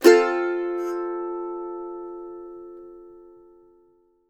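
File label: MANDO MAJ ST, mandolin F major; Sampleheads; Whole Lotta Country 1 & 2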